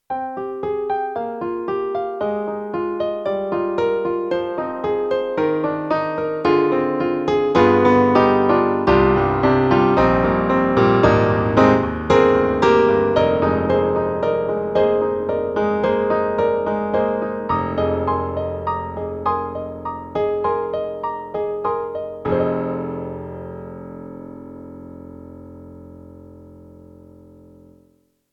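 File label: YAMAHA MU1000EX, Concert Grand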